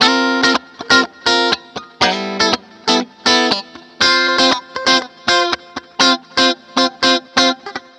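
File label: GIT-CHRDLICK, guitar chord lick, Disco; Best Service ProSamples vol.48 - Disco Fever; AKAI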